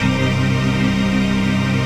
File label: HOUS PAD, House Dance; 90 s Sample CDs; Best Service Dance Mega